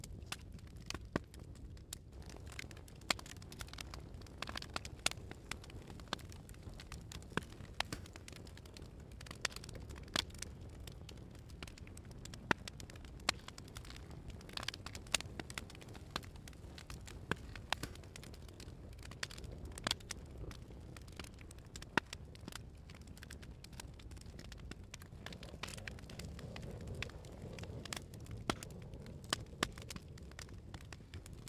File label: ASMR Fireplace Sounds, Sleepy Fireplace